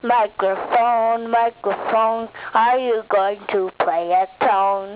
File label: mic song